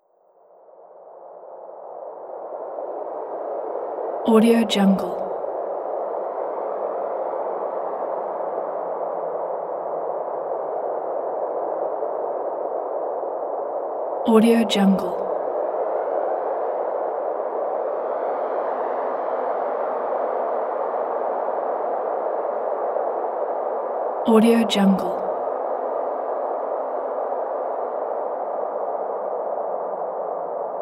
space wind ambience

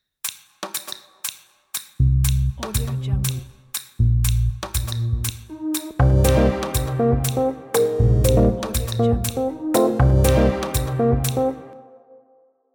elegant reveal opener logo

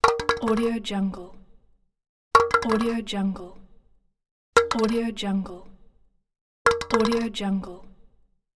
plastic fall

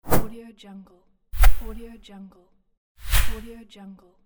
short woosh

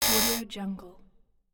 scifi interface error

